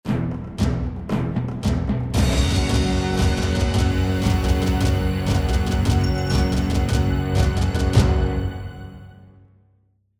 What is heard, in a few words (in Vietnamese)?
Âm thanh anh hùng